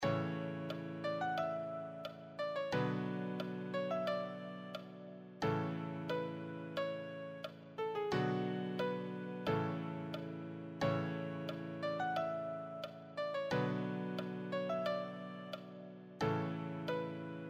easy solo, piano

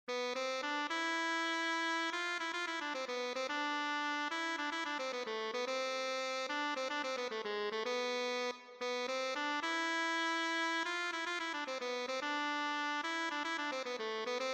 tenor saxophone

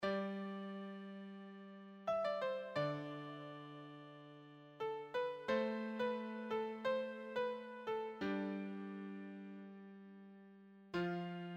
beginner solo sheet, piano

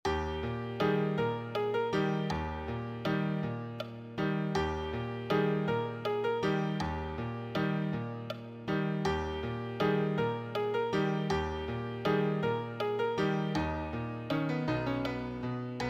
solo, piano

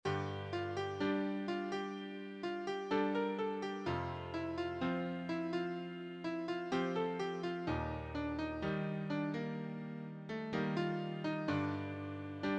solo, piano

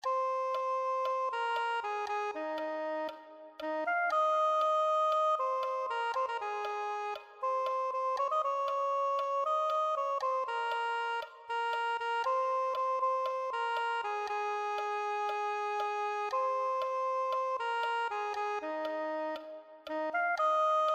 soprano saxophone

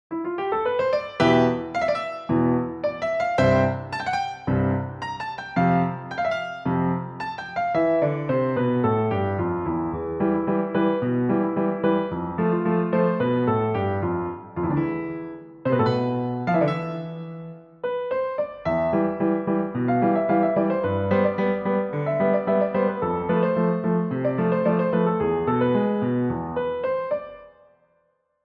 solo, piano; The Train

